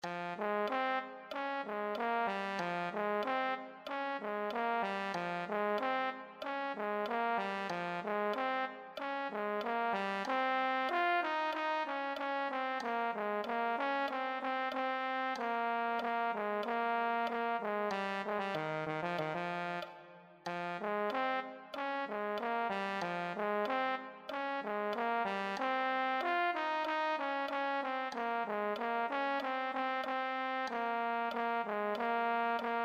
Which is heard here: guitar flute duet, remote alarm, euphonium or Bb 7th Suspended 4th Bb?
euphonium